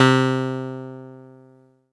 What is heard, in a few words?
Kawai K1r Clavinet C3